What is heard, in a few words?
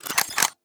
bolt rifle bolt forward; Rust